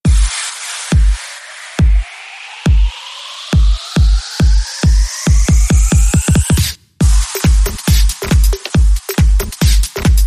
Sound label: Pubstep Beat